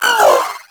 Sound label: Companion Vocal Pain; Pets; Warframe; Zanuka